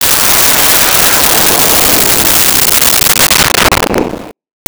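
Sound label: Missile Fly By, Weapons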